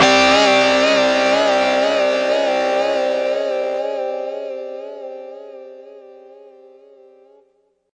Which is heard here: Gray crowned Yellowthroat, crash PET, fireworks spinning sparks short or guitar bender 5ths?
guitar bender 5ths